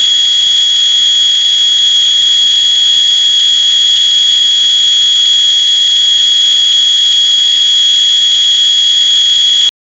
maxed out very high pitch, internal combustion engine